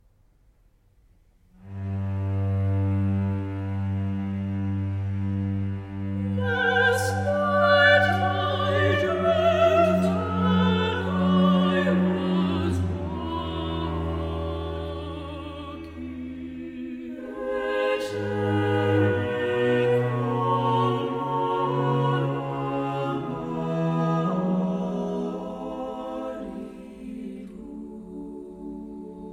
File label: chorus duet; III. The Death of Antigone; Sophie's Dream; Ecce quomodo moritur